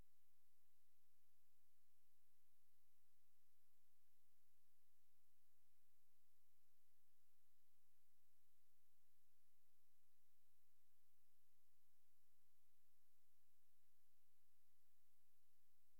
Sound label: DX7; skate